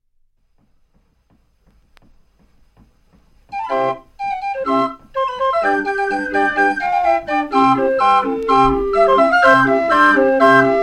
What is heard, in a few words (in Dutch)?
musettina, draaiorgel